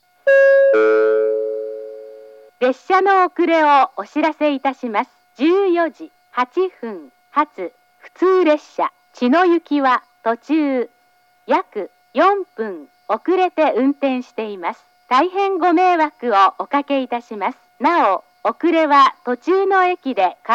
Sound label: local delay; murai; chino; jr-east